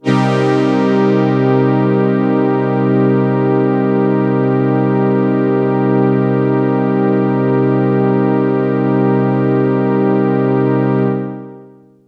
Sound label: STRINGS MIN, Synths